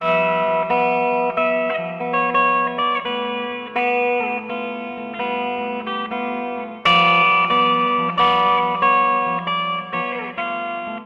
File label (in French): Guitare